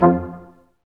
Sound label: BRS Quintet stac; Roland L-CD702